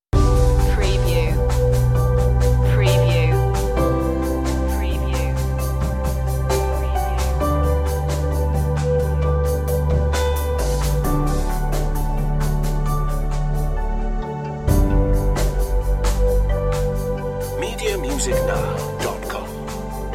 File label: Ambient Chillout, A pleasant, slowly moving track; blissful moment